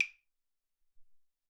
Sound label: Claves